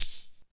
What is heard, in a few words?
PER TA, Percussion, Tambourine